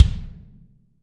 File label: BD, Real Mega Drums